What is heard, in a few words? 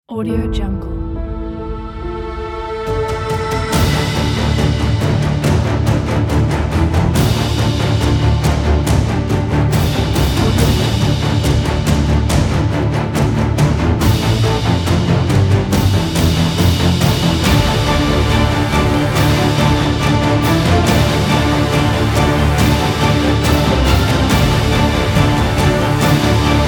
action